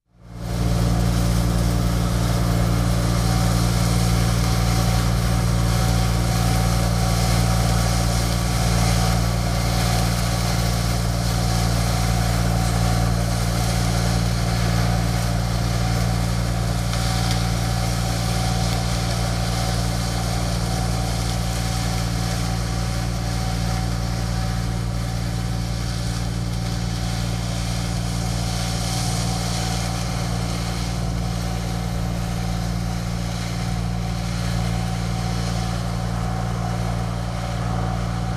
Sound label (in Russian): zvuk kombajna i zerna